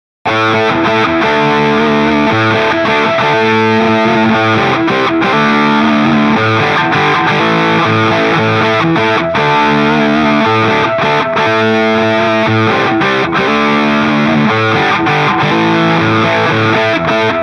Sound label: Intro Extra Rhythm